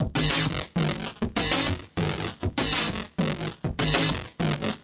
199 fm crunchfest, drum loops and breaks

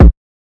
Kick Drum; drums; hip hop; STYLE KITS (Hip Hop, Etc); Khezie Hip Hop Kit

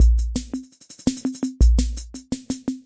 toy drum loop, 168 bpm

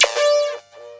siren rubberband, electro FX

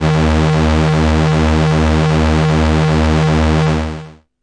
bass D sharp 2; multisampled; must destroy you bass 3

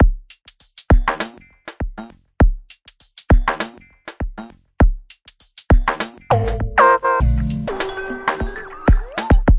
weirdness, drum loops and breaks, 100 bpm

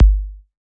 lower thud; Kicks; single hits

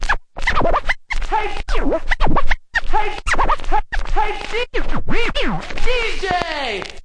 turntable hey dj; Scratching and Turntablism; DRUM LOOPS and BREAKS

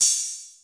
T6 Hats; DRUM MACHINES

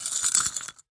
Staples Slide; ModeAudio Found Percussion Hits

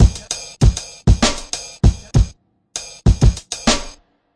98 drunken ride, drum loops and breaks; 091 to 100 bpm

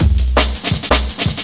165 bpm drop bass and roll, drum loops and breaks

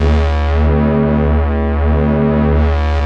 atmosphere, pads; single samples

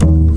Upright Bass Notes

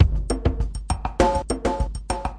watery echo drum, drum loops and breaks, 100 bpm; 091 to 100 bpm

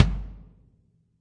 real kick; REAL LIVE KITS; A Real Freakin Drum Kit

